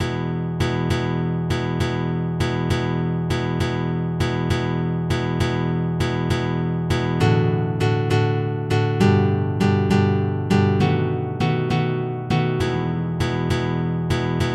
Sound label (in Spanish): guitarra acordes